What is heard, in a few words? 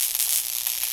Spark; In the Line of Duty Firefighter